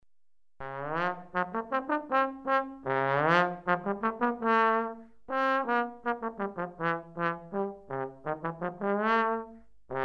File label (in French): trombone